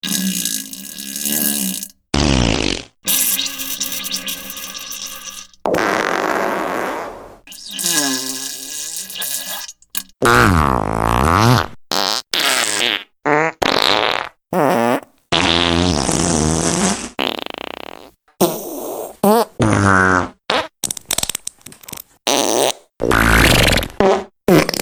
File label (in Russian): Fart Sounds - Пукающий звук (Пердеж подливой)